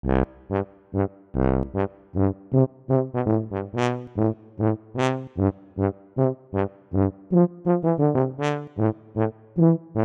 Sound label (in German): Alpine Volksmusik Tuba